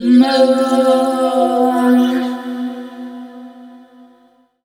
VOICE FX, Dance Vocals

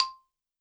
BALAFON; Best Service ProSamples vol.52 - World Instruments 2; AKAI

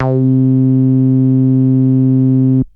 Bass Synths hits and octaves

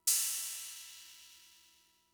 Cymbal, Fricke MFB-522, Drum Machines

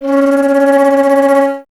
flute C#; Miroslav Vitous - Solo Instruments VOL-2; Solo lnst. II

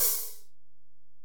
HiHat, Alesis DM-PRO

